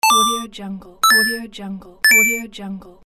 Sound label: coin collect; robotsound